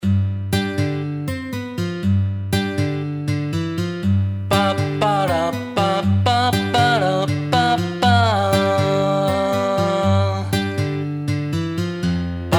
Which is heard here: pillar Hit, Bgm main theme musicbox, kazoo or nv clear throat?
kazoo